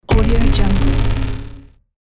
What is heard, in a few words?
the impact logo